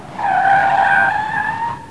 Screeching Tires; Sounds & Icons You Wish You Had

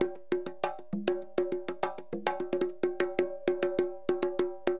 CONGA, Perc; LiveAct Pool; AcidJazz Funk

